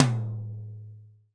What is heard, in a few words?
TOM, Real Kit; 90 s Sample CDs; Sound & Vision - Gigapack I CD 1 (Roland); Real-Kit m16